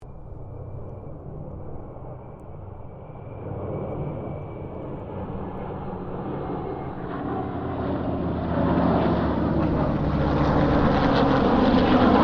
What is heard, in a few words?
AIRCRAFT SABRE METERO MUSTANG KITTYHAWK HORNET PASS; Mixed aircraft squadrons; Airplanes (Sound Effects:Vehicles)